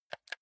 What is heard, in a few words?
console controller r1 press